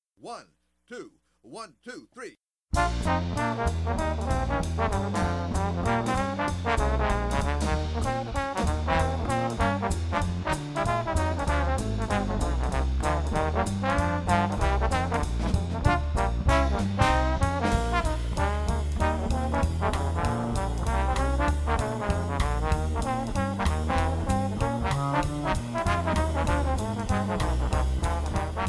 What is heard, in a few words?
duet